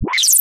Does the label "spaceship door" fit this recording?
Yes